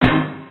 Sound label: extra ball, keno; games; Bingo